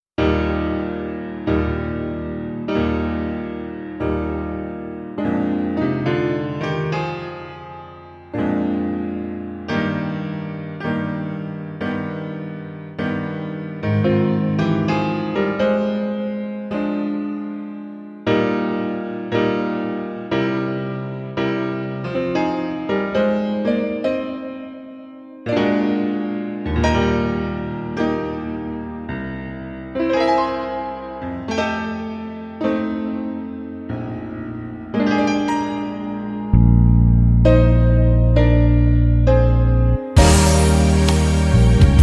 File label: brass minus